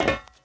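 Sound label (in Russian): перестукивание пальцами по железному баку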